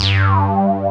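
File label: ACIDIC BASS; Pro-Rec - Synthsonic; TRINITY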